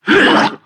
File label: garbage regurgitate projectile fire